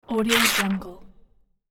paper page flex and rip; hollywoodedge

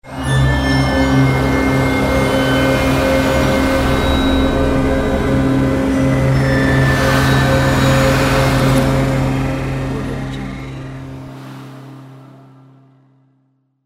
psychological drone; jbobstudio

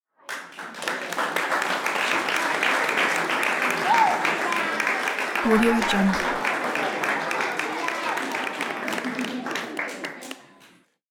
indoor crowd applause

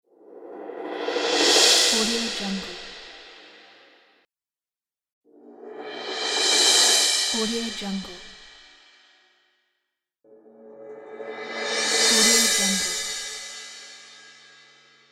cymbal roll

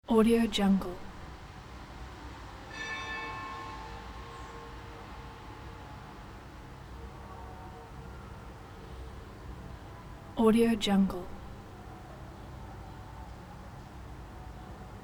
ambiance near the city church

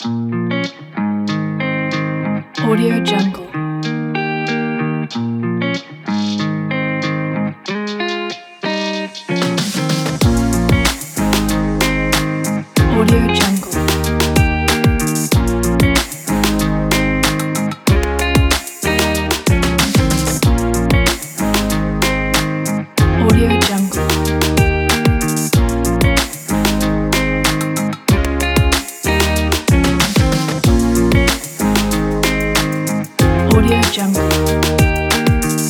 motivational indie pop

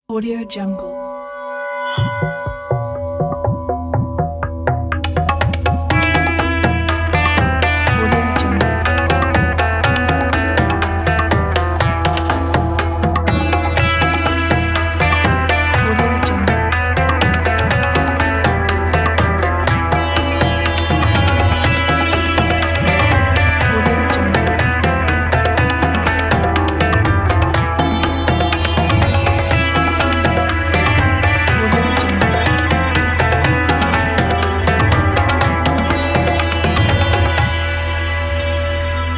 indian flavour

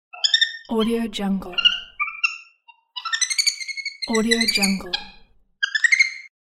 small monkey; audio-therapy

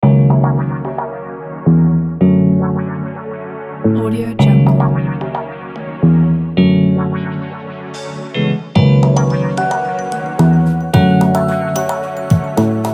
lounge background loop

